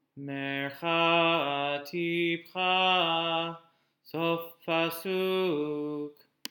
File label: Torah Trop Pattern